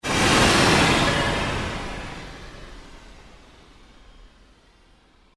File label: PULSE LONG SWEEP